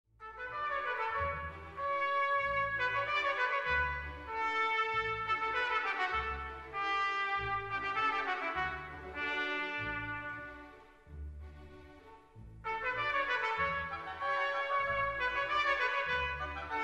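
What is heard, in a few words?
classical philharmonic orchestra; bonny dirizer heribert bajssel p i cajkov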